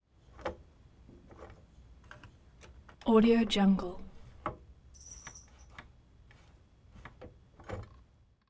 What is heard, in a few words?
levers lawnmower metallic clicks movement